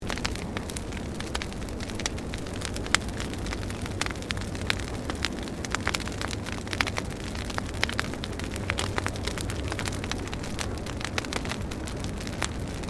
Fire Burning Off Medium Fresh Lit Close Loop, controlled fire burn; Flames; Objects